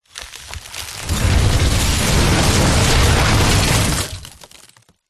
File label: impacts building collapse